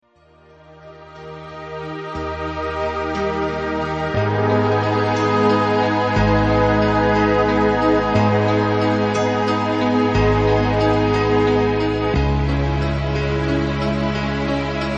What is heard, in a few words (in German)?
Traumreise Entspannungsmusik